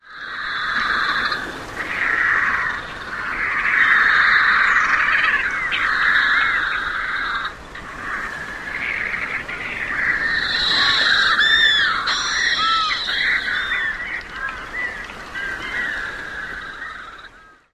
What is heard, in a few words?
colony calls